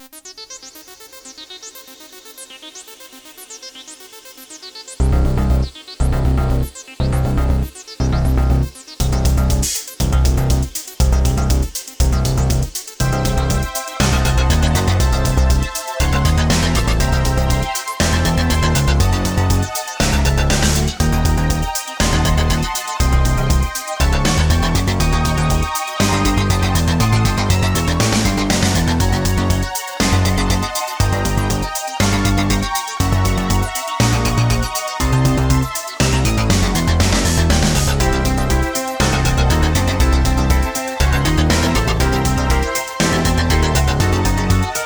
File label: music; Ajouts de musique et irrKlang.